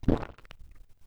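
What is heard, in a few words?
heavy swallow; Lewd SFX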